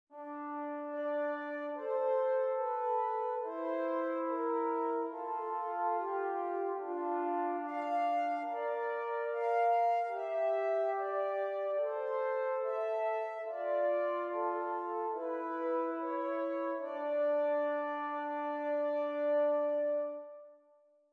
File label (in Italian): Esercizi di contrappunto a due voci; zweite Gattung; Ronge pagina 58